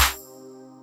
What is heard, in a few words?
Clappp, Drums; BB 2HTC Samples Vol 4